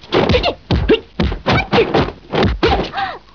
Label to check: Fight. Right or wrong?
Right